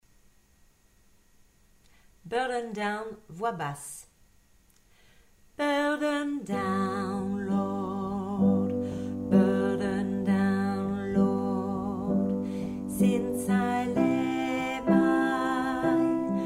basse

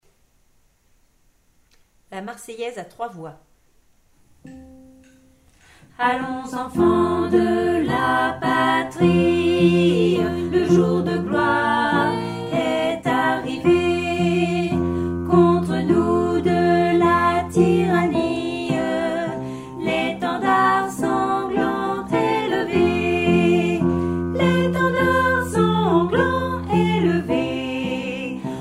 la marseillaise 3 voix

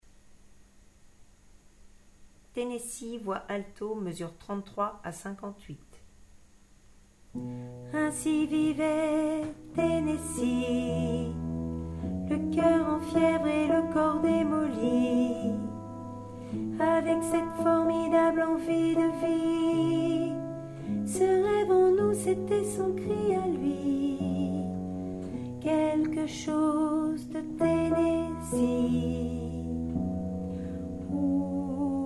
alto

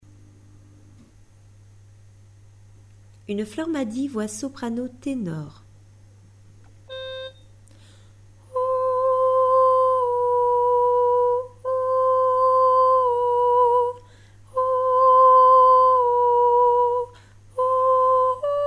soprano tenor; 2 voix

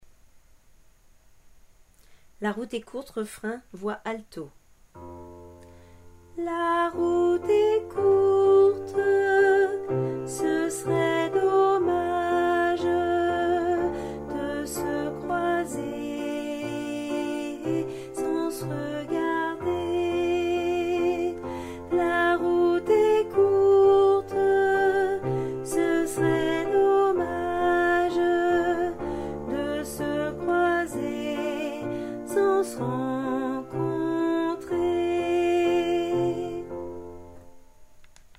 refrain alto

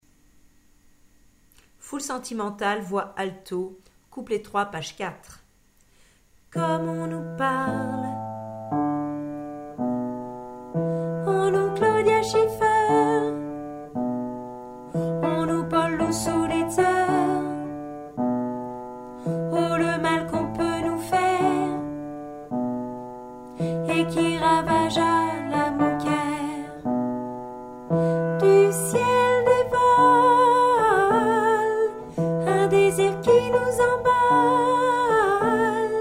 alto couplet

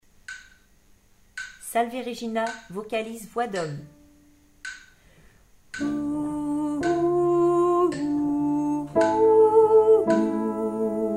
salve regina vocalise hommes

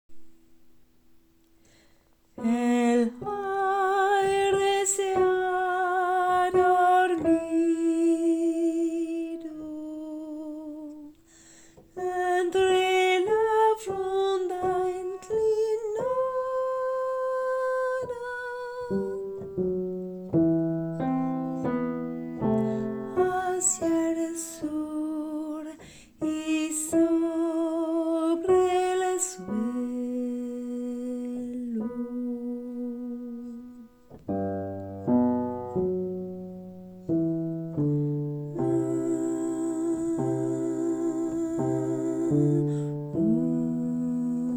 femmes; crepuscular

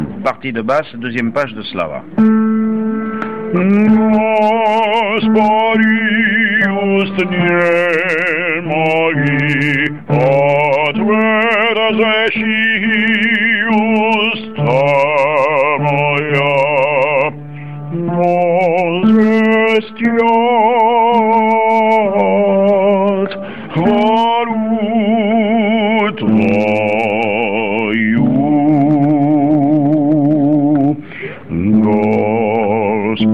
basse; slava v vychnih bogou

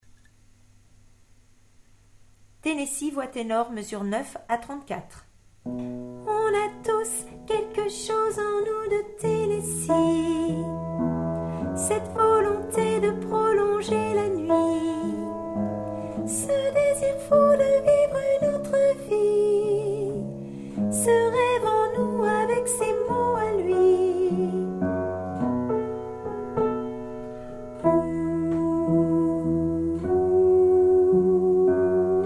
tenor

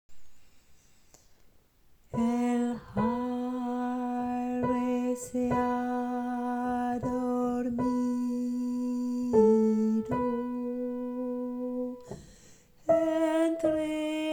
tenor medium; crepuscular

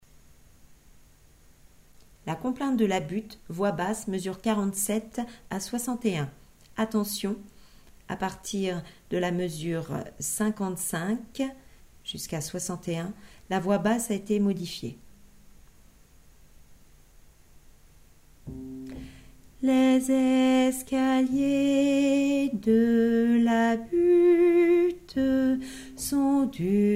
basse

